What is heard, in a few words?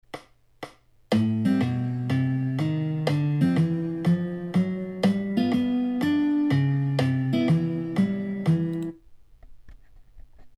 jazz bass chord